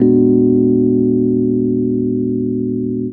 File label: RHODES; Houseworx